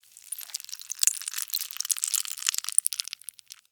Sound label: Larva Maggot Move; Blastwave FX